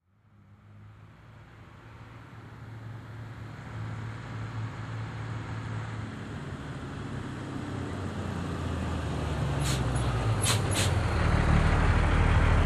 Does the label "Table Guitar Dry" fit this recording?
No